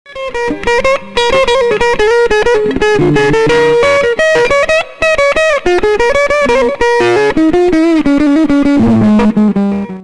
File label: boss od3, guitar effector; guitar effector variation